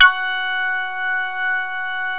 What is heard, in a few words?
SYN ANA5; Best Service Gigapack 1